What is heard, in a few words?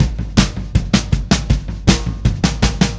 Happy Pub Beat, Indie Rock; Sonic Foundry (Sony Creative Software); Indie Rock Anthems; Session 05 - Happy Pub